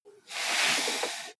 computer mouse draw long

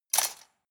cutlery plastic multiple drawer place hard

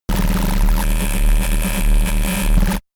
space charged laser blaster unsteady

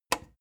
plastic button small simple click fast

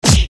fist hit seq

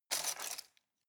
cutlery plastic multiple drawer grab

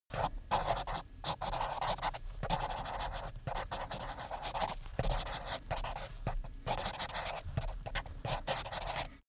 pen on paper

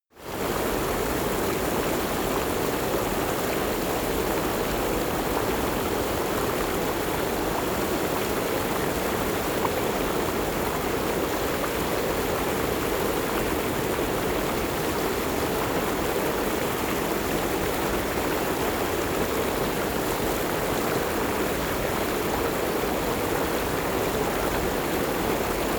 river stream bubble

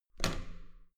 metal door heavy handle up hard

